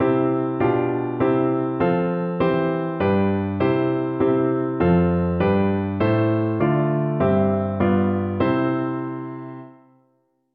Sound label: Harmony Classic